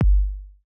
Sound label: 808 long, Akai MPC-5000; Internal Rom 2